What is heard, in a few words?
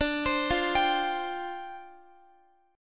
D Minor 11th D, Guitar; Dm11 arpeggiated; JGuitar